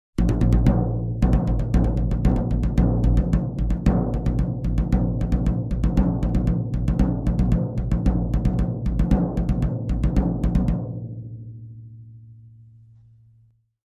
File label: Drums Toms Rhythm, drums tom